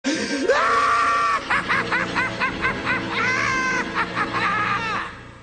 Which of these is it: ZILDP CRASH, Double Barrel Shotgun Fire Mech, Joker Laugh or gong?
Joker Laugh